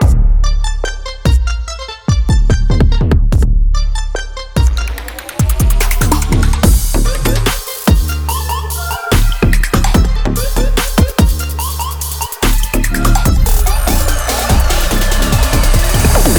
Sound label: Podcasting Prelude Seamless Loop